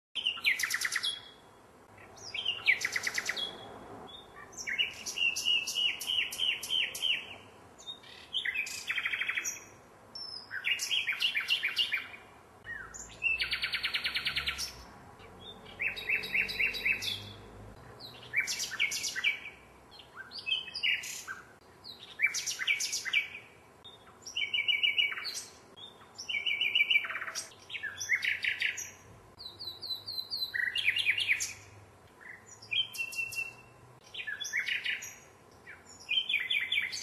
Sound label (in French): chant d oiseau le rossignol